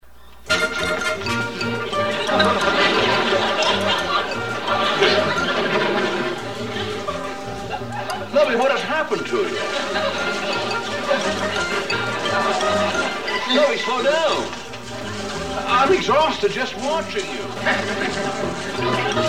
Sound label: fast music